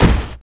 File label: BD, Drum & Bass, 160 BPM; Ueberschall; Drum & Bass Flash Trackz